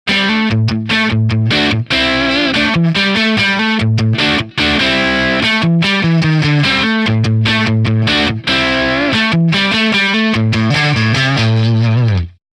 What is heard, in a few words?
top boost tone